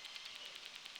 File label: Background Noise Clips